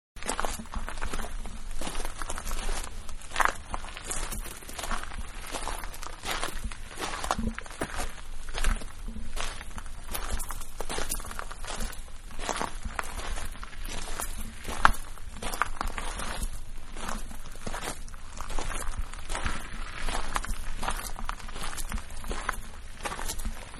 Walking On Gravel Slowly; Footsteps (Sound Effects:Human); Manhattan Production Music

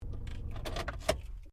CAR SUBARU LIBERTY INTERIOR SHUTDOWN; Subaru Liberty 20 01; Family members Subaru; Vehicles

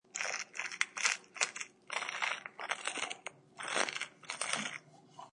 Grinder pepper with a hand held grinder.; Kitchen; Household

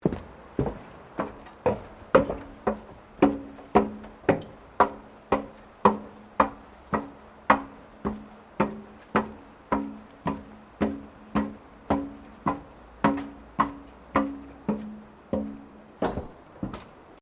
FOOTSTEPS WOMENS LOW BOOTS ESCALATOR SEQUENCE; Footstep Foley; Footsteps (Sound Effects:Human)

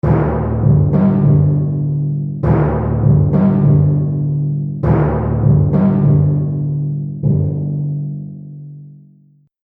War Drums Loop; Musical Sound Effects

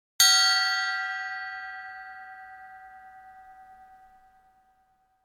Bell Chime, Single bell chime.; Multimedia

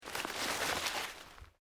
Underbrush Dead Move, Rustling

